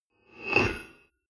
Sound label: swell short industrial; sweeps-swells